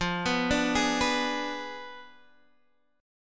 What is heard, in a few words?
F Diminished 7th Guitar Standard; arpeggiated; 13,14,12,13,12,x; JGuitar